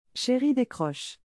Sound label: ringtone